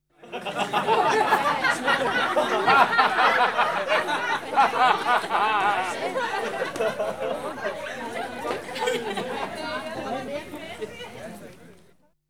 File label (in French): Rires sans applaudissements joie